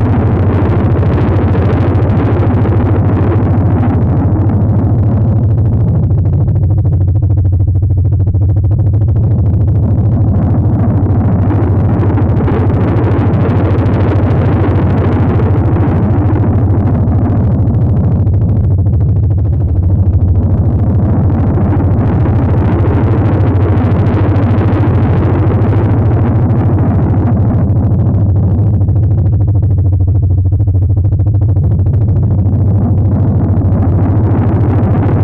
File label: giant fan sci-fi pulse; Titanfall 2